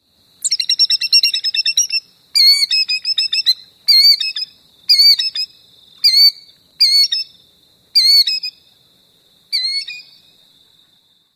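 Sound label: outburst and calls, bird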